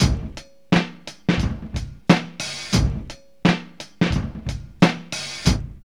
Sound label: OVER RING 88, Funky Elements LOOPS; Zero-G